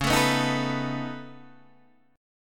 C sharp 7th Suspended 2nd Sharp 5th C sharp down Guitar Open D